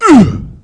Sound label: pain, male; npc